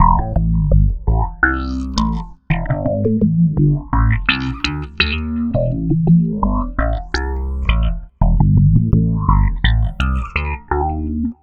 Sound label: Processed Slap Bass, Electric Bass; Sonic Foundry (Sony Creative Software); Leo Cavallo Bass Taster